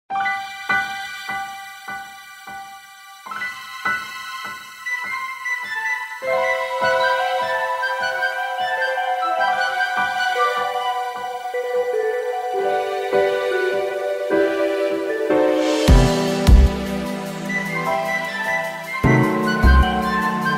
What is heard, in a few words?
Orchestral Remix; Pokemon DPPt